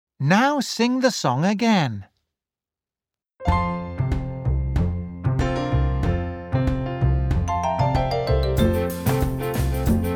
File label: Karaoke; Super Minds Level2